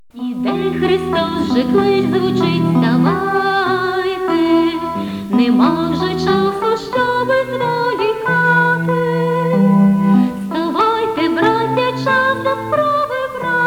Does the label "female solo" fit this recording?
Yes